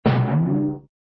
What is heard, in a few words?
ba wong; cartoon; hannah barbera cartoon sound fx